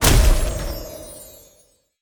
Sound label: Grenade Snowball Explosion